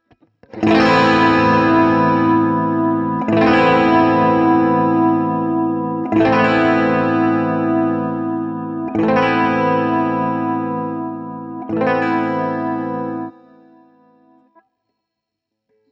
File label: Gretsch ETMC LTD Pristine JET Master Volume Treble Bleed